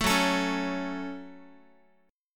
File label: Gb Minor Gb Guitar; strummed; JGuitar